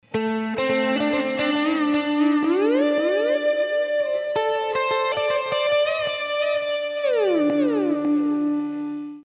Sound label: Ricochet mid